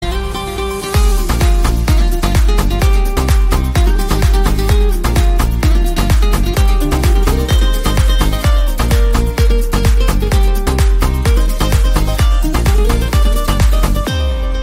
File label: Guitar